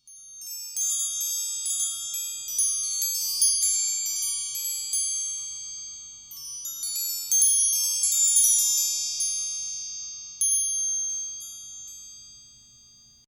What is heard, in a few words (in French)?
carillon petit; chakras corail rouge